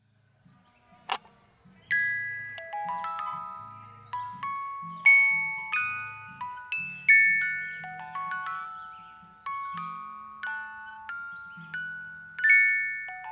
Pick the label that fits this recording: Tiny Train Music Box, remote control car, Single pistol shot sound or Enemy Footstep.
Tiny Train Music Box